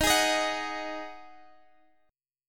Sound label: D sharp Minor Double Flat 5th D sharp, down, Guitar, Standard; strummed; chord